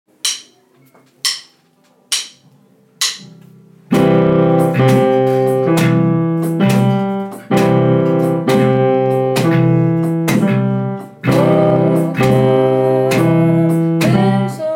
blues

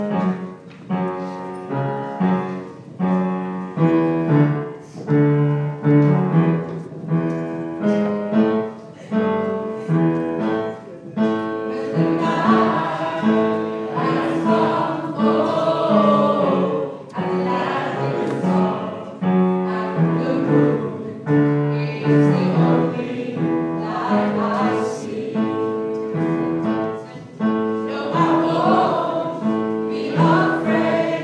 school choir rehearsal

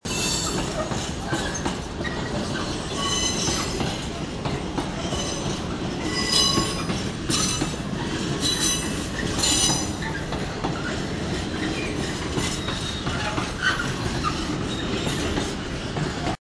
train pulling empty carts

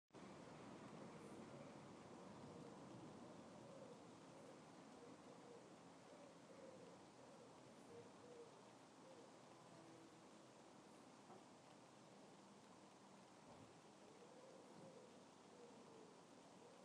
pigeon and plane

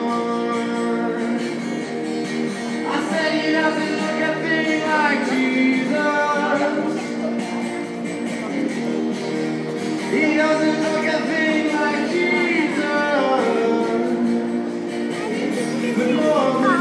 busker; outside next

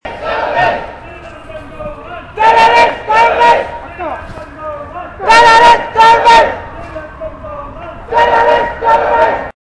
tamil tiger protest; now in bayswater

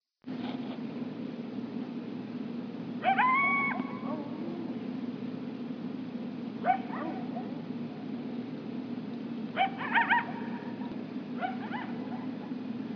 coyote howl; placitas nm

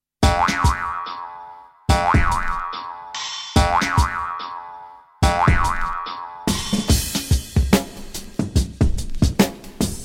violins strumming

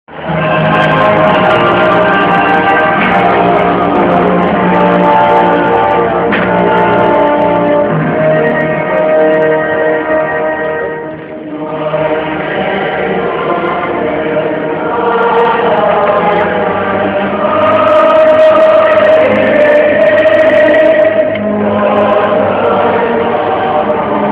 welsh anthem at wales v south africa; rwc2011

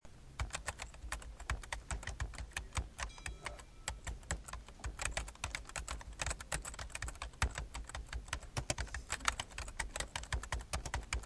keyboard

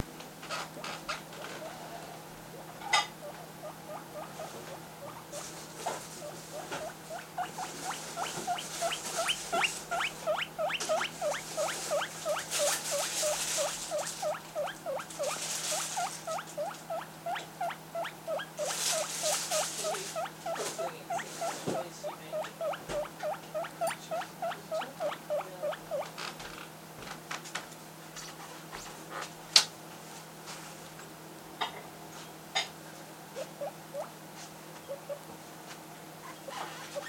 guinea pig squeaking and purring before tea

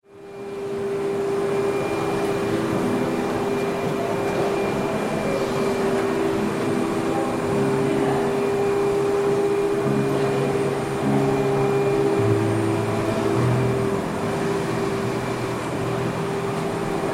hoovering and piano piece

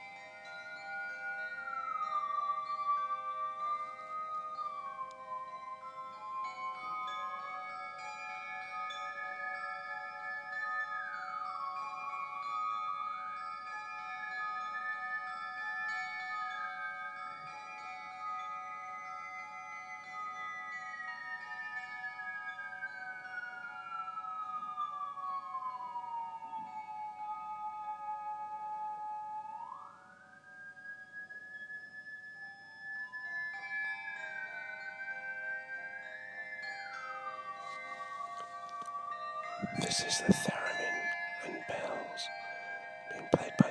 theremin; bbcclickradio

